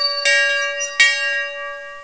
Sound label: Very Short Bell